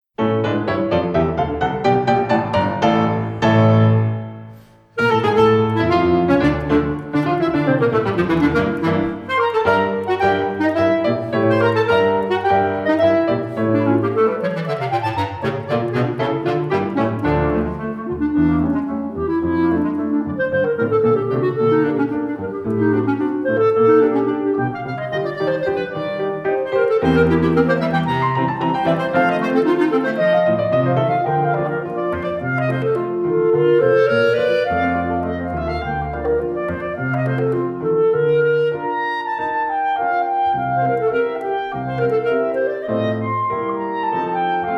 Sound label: CLARINET CLASSICA, Presto; Concertstück op 114